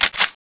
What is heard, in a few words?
clip out; tfa cso; pp2000